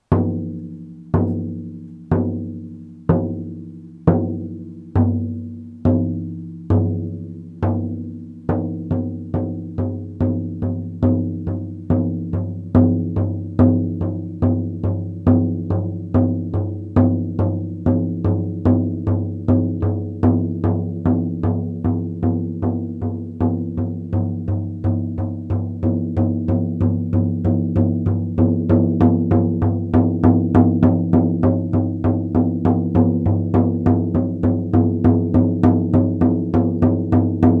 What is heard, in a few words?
Spiritual Shield, shamanic reindeer drum